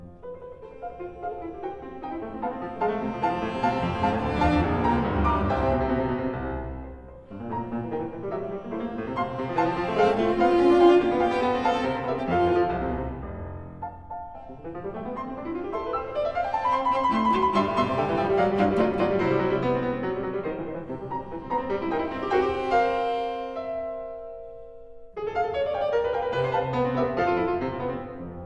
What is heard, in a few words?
Variations for cello & piano in F major